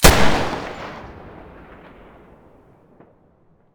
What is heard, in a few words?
muzzle deagle mono, weapons